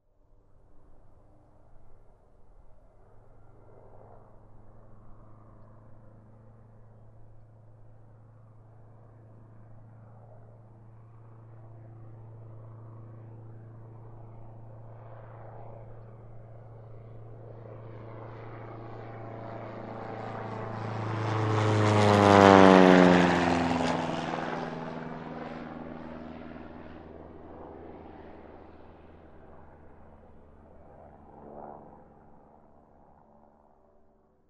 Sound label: Biplane Flying Over